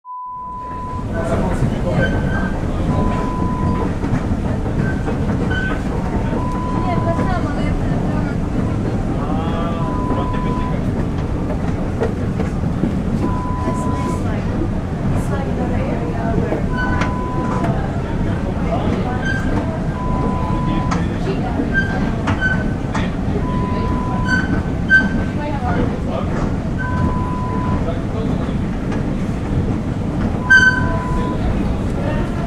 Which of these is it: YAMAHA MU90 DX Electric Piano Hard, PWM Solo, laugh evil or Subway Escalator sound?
Subway Escalator sound